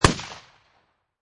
Shot Gun Remington 870 12 Gauge Bird Shot Single Shot Exterior At 45'